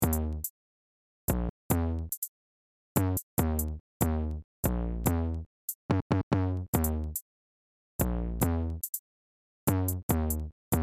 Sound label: love music